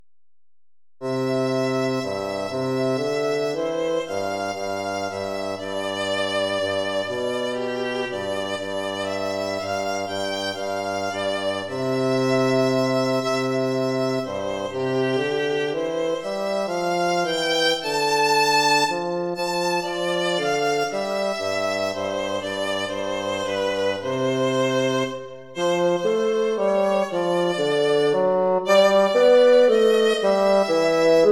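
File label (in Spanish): danza cortesana para violin fagot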